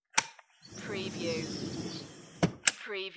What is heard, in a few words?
Aluminium Window Slide and Close